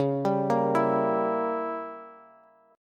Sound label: Db Diminished 7th Db down Bass; arpeggiated; JGuitar